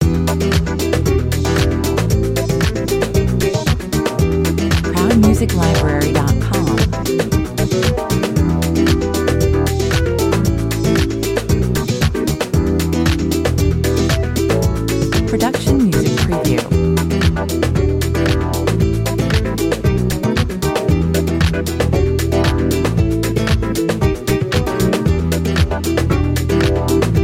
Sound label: loop, 114 bpm